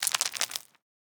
fantasy game material stone pick up

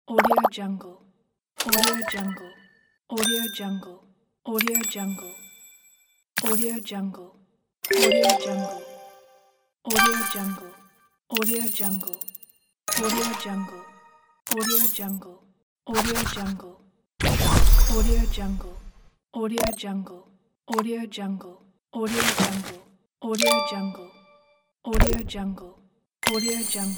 interface sound pack

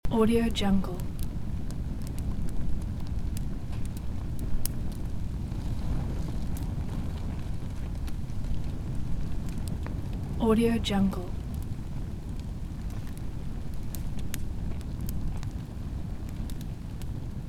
burning papers in fire loop

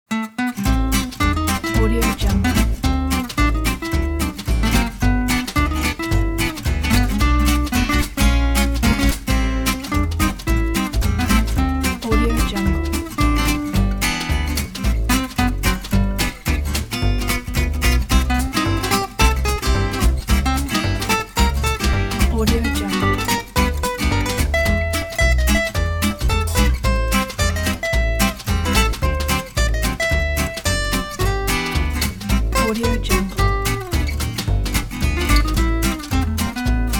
gipsy french jazz

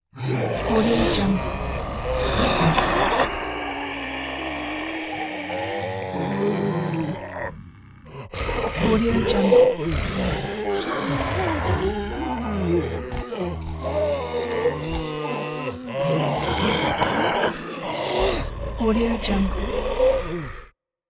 zombie group; marburymedia

